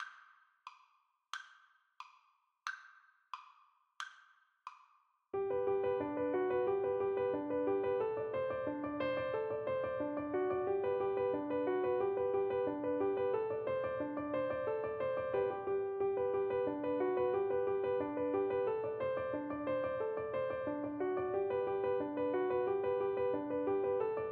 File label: hornpipe Flute